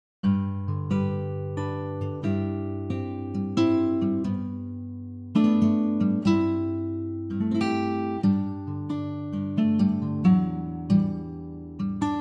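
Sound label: G to Ab acoustic guitar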